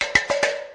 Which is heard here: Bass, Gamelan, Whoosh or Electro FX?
Electro FX